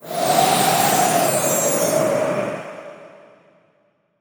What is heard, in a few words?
blizzard; netent